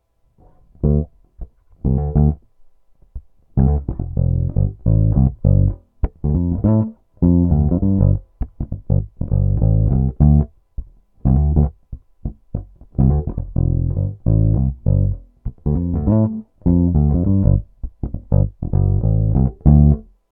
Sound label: Both Fingerstyle Tone DOWN; peavey shootout audio samples